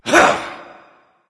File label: grunt jump; smite; anhur